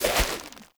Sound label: Fishing Spear Charge; Weapons; Warframe; Eidolon Fishing Spears